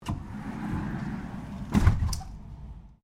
Door Slide Industrial Freezer Close Stereo, Industrial freezer sliding door